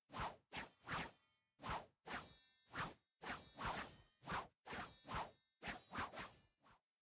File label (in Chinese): 书店外黄昏 舞刀声音; 环境音